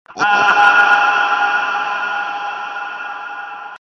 laugh reverb

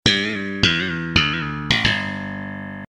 sitcom transition bass riff; seinfeld

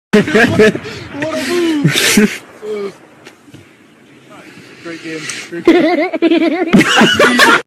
goofy laugh; cod

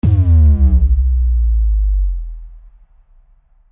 bass drop

